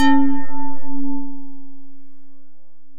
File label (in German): Gender Flach foellig frei